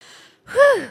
surprised; emotes

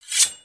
sword draw; ambience avant gardens

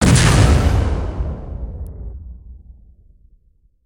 Spider Boss Fire Rain Impact; creatures mechanical

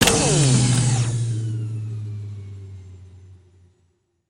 button fan power down; ambience avant gardens

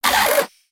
Spider Boss Fidget; creatures mechanical

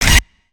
Robot Move Arm Up; creatures mechanical; Lego

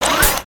Spider Boss Strafe Finish; creatures mechanical

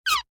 Broom Bot step, creatures mechanical

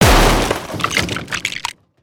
Metallic; destructibles

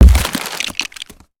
Present Smash; destructibles

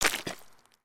Foot Mud Walk Light; footsteps

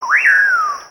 Bird Amazon, ambience avant gardens; Lego

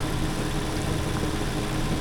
Bubble Washing; creatures mechanical

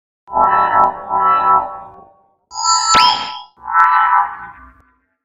Robot Idle Scan; creatures mechanical; Lego

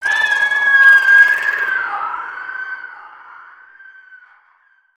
Spider Boss Baby Scream; creatures mechanical

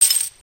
Chain Rattle; Strom Capt; ambience gnarled forest

